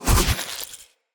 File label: Corruption Block Cast; Characters; Enemy; Lady Deora; Abilities